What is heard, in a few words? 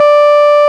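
RMI EP piano D 4; Sonic Reality; Vintage Timetraveler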